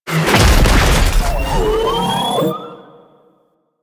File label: Jackal Redux Intro Jackal Land; Warframe